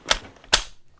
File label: Inf Weapons Foley; Red Orchestra Ostfront 41-45